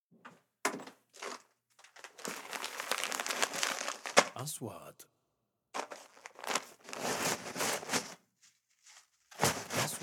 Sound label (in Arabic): ورق، مزع ورق